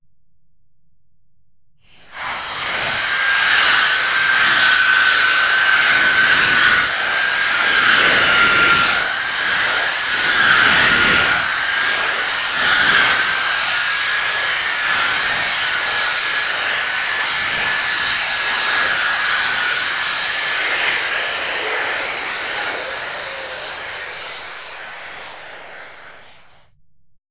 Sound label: sharpen long